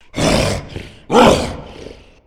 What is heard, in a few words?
tank voice, zombie; Left 4 Fortress